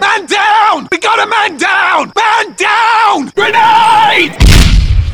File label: Man Down Grenade; Hunters and Props